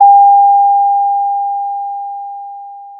Sound of a doorbell

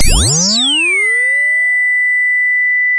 pop, sound of a bike bell

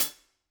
room Cl Hat, drum

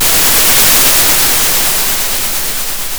pop, Sound of a thunderstorm

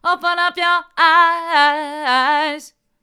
hard, vocal

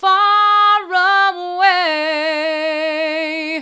faraway hard, vocal

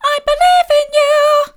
i believe in you mid, vocal

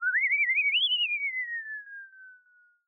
Sound of an owl

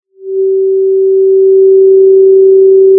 Sound of a cruise ship horn

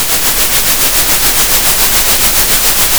pop, Sound of a train

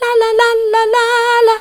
la la soft, vocal

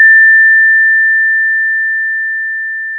pop, Sound of a school bell

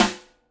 Snr, drum

